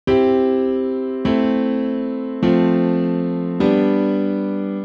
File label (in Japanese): トライアド